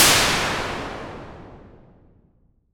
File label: impulse